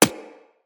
Bullet Impact Generic

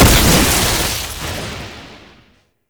Sniper Lightning Strike; Weapons; Warframe; Sentient; Caliban Prime Sniper

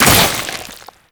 Infested Arm Cannon Mech; Weapons

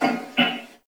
Wolf Breath; Animals; Duviri

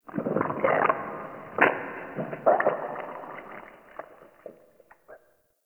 Digest Interior Medium; Ambience; Warframe; Sentient Lair

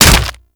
Infested Ancient Arm Attack Hit

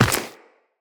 Run Dirt, Footsteps; Tenno

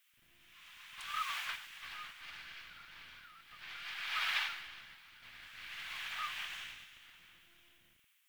Wind Gust; Warframe; Eidolon Plains; Ambience

